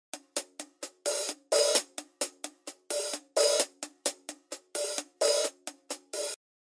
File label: drum HH none